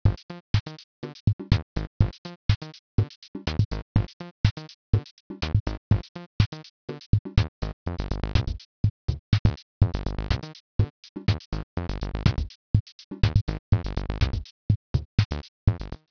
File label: Techno